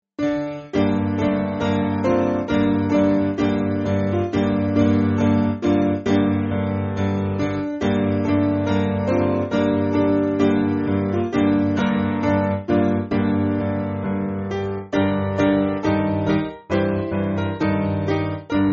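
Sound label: Piano, Music Backing Files for Churches; Twas In The Moon; Une Jeune Pucelle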